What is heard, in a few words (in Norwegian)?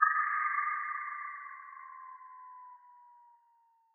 Skiftring